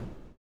STOMPER; Blues Soul; STOMPS; Spectrasonics Vocal Planet

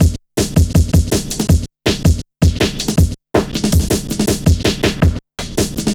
Drum Stepz, Drum Loops 161.5; Zero-G Jungle Warfare 1, 2 & 3

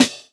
Snare, jungle breaks drum sounds

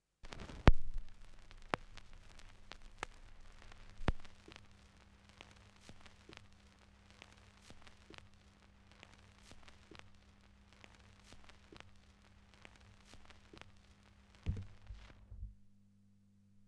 Turntablism; Sonokinetic - The Roots Drumloops

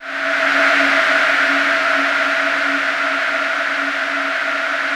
DEEP PAD C5, Yamaha VP-1; Music Store; 90's Sample CDs

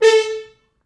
Horns stops

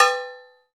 COWB, Cow Bells, Roland

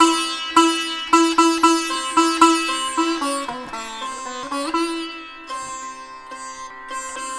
SITAR, Indian Traditions; USB Soundscan vol.45; AKAI

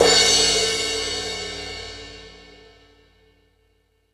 Fusion Stix, E-MU Pro-Cussion

